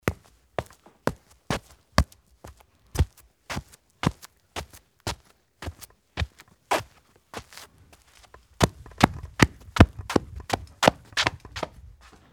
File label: footstep shoes